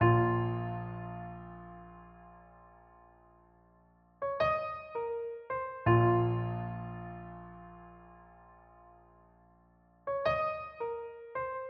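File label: A minor 164bpm piano; Yung Boi Drip Vol 6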